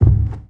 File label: large, steps; quakemapping